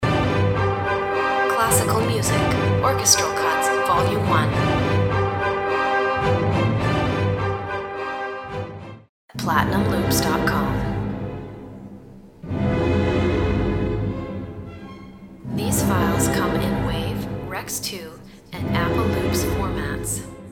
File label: orchestral cuts